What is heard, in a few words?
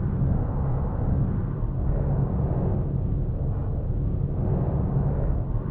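Engine Thrust Interior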